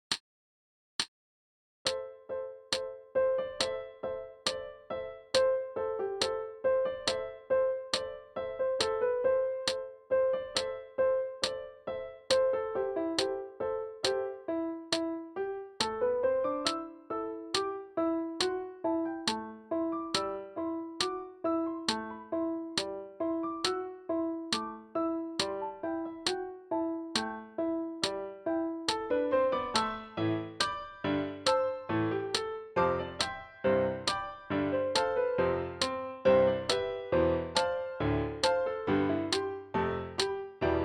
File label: Slow, Clarinet; Six Studies Clarinet